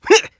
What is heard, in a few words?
grunt; sonic; vc roger